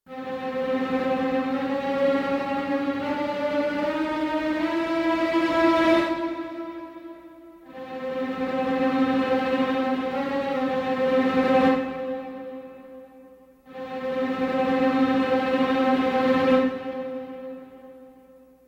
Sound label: strings alarm, Sad; Loops